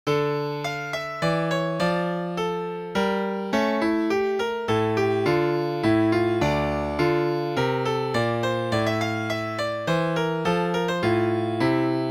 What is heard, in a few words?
Menuet in D minor, Piano